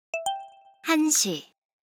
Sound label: Hourly Alert; SKY IM-U680L